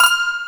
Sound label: YANG-CH'IN; AKAI S6000 CD-ROM - Volume 1